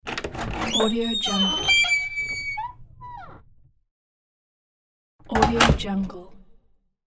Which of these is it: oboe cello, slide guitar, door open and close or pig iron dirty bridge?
door open and close